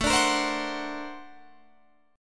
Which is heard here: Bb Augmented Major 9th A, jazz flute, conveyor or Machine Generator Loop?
Bb Augmented Major 9th A